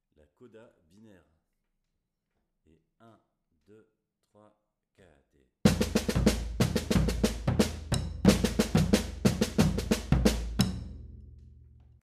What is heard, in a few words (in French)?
Coda Binaire, Batucada